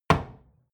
wood wood staff indoors

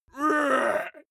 male shout hit

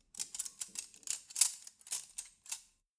cutlery forks handling

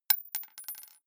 gun bullet drop

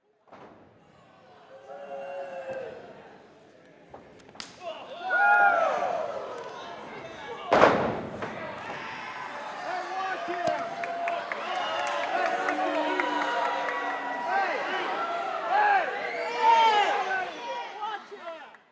match with crowd

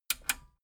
metal button radio click disable fast

